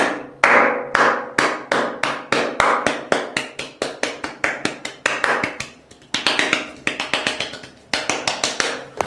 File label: slaps